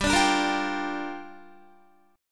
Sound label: G Minor 7th, Guitar; Gm7; strummed; 14,17,14,15,17,15